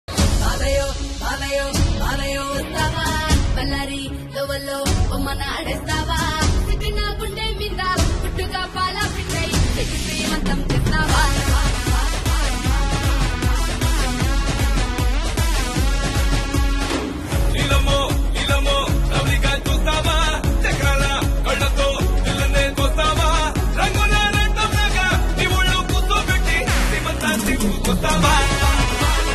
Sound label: telugu ringtone